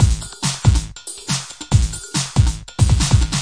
140 bpm electro tekno distrt, drum loops and breaks